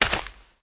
Step Dirt

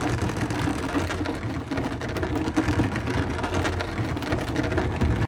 bike wood

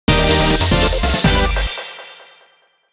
Goal; チャリ走DX

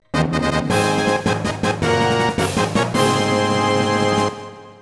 Race Start Fanfare

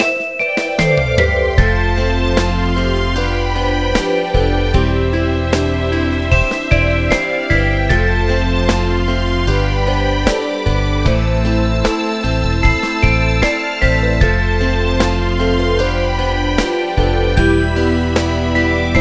BGM Autumn; puzzling masterpiece exploration 2009 nds gamerip

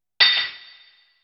HP Warning Sound; dot hack

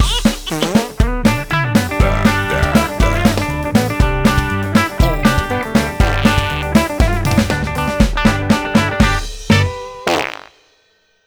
Carnival Dance Jingle; Shrek Forever After

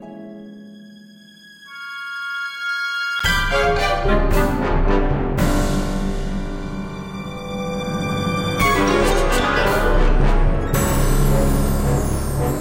Eerie Stinger; Back to the Future: The Game; Android; PS3; Wii; Windows; Xbox 360

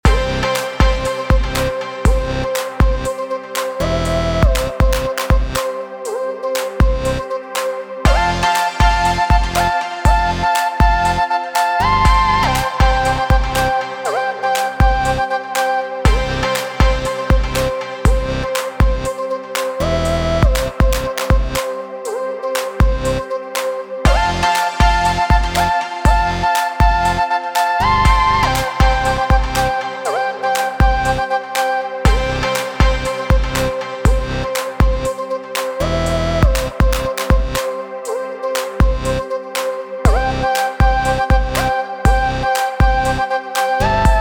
loop

